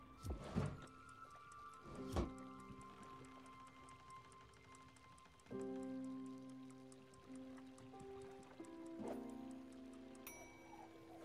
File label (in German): kochen; spiritfarer